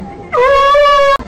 phoque ouuuuu

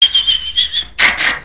oiseau

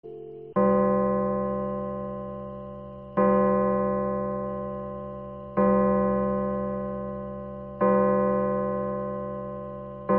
pendule 12 coups

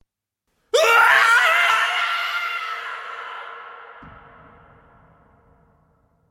hurlement de colere fantome

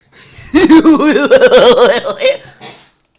rire goofy

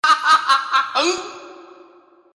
rire; joker

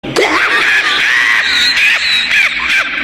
mort de rire

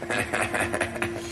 rire

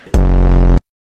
froid bip

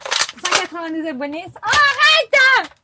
couineuse

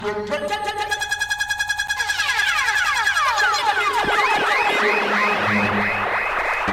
electro choc; gta 4

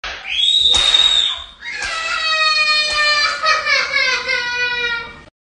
bebe crie

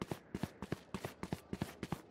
bruit de pas; valo

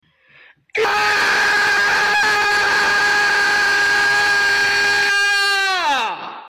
vieux aah

